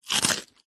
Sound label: human eat crunch bite single dry cereal piece